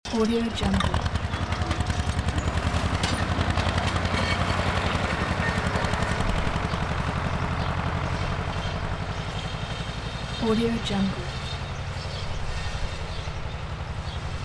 tractor mowing on countryside field in distance loop